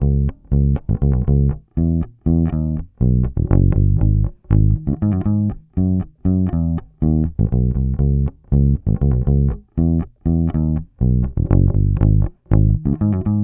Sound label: Bass loop 120 Dm, Electric Bass Loops Direct; Slate Digital BASSHOLE Sample Pack